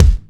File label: rich mids kickdrum sample g sharp key